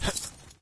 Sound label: jump, player; Source Engine; dod